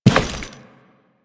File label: Step Default, Footsteps